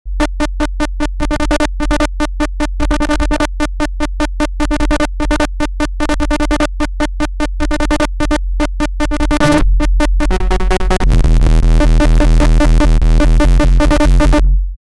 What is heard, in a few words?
a weird oscillating dub